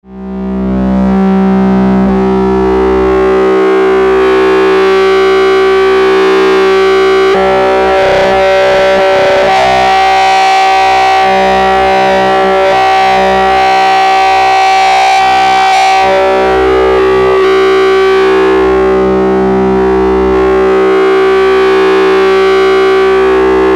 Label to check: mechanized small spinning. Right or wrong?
Wrong